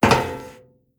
Metal Walk

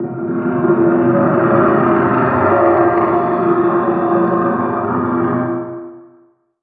Random Rock

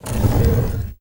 Book Delete Return

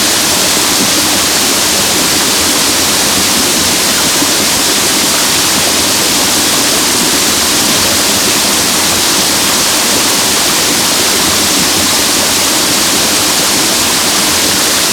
Waterfall Loop; moul

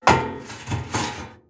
Metal Turn; moul-assets

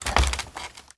Wood Ladder

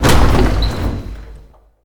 Library Doors Open

